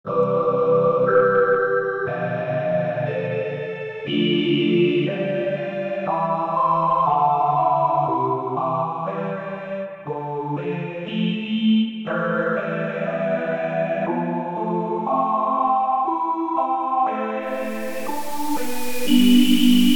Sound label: Choir, Choir Synthesizer